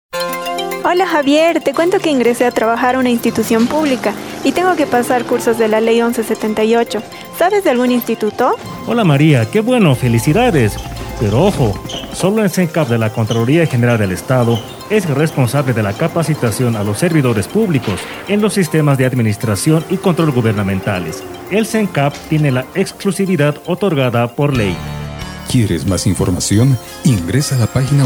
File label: jingle